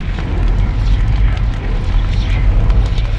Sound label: Maelstrom Fire; spaceship